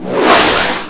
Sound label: missile; asciisec